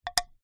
leisure draughts game piece place down onto board square